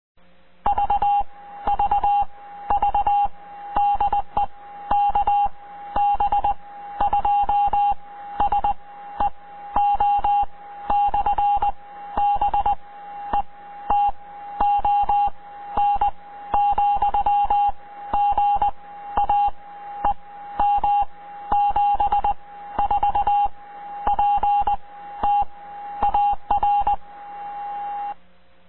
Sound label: beacon